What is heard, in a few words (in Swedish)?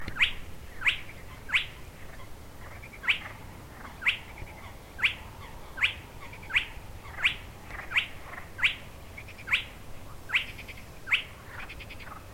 Smaflackig sumphona